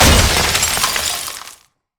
ring of frost; LoL; lissandra

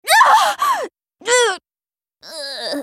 dying; Nami; Character Voices - English

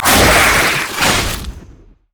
spider vortex; LoL

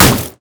basic attacks; Ziggs; poolparty

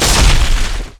crit attack; LoL; tryndamere

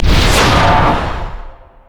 slash; LoL; tryndamere

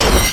hydro blast; nami base

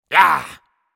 Lich effort

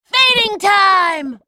ultimate effort; Fizz

